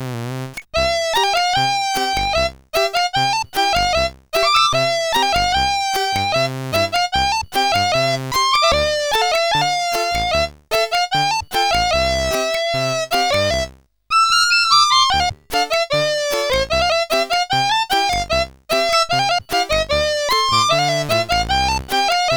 Nokia Ringtone Arabic, sid CSG8580R5

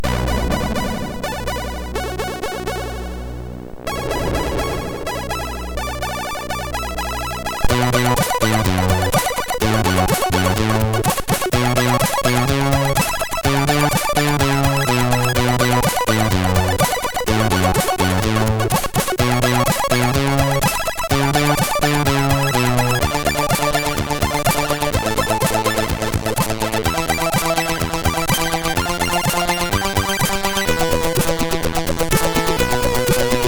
Mars Disco; MOS6581R3

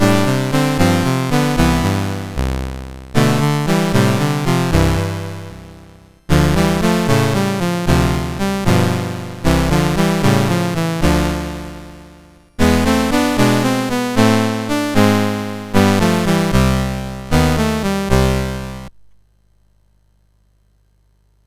Project Firestart, MOS6581R4

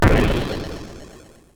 SFX; Narco Police

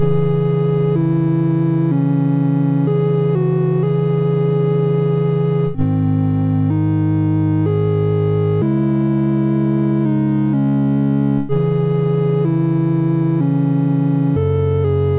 MOS6581R3, GAMES; Woodpecker

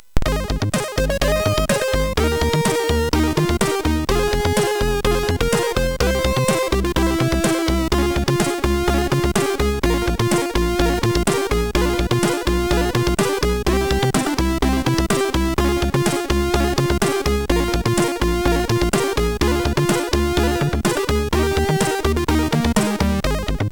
Congratulation, sid MOS6581R4; hvsc

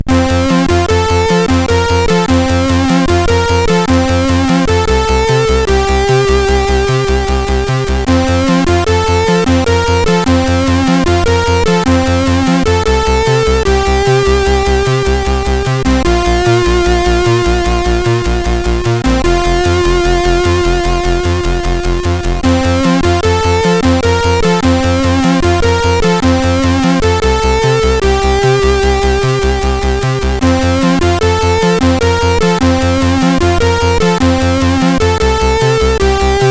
sid CSG8580R5

